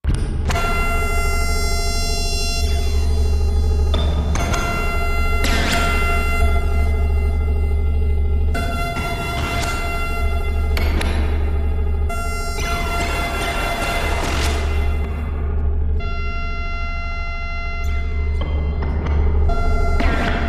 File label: Laser Calibrator Mach, sci fi ambience